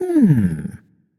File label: nv thinking; smite; voicepack; ganesha